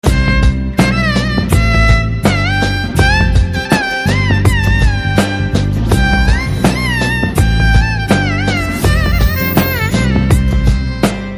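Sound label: background music Telugu Ringtone; F2 Fun and Frustration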